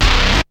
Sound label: BASS; SoundFactory; SMPMANIA